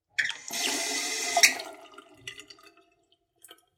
水道の蛇口をひねる音

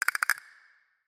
カスタネットの音

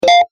ゲームのボタン音

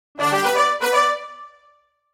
ファンファーレ

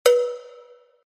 タライや缶の音

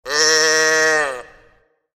羊さんの泣き声

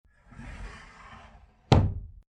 襖を開閉する音

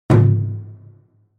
和太鼓を鳴らす音

